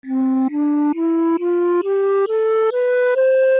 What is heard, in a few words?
Ascending Scale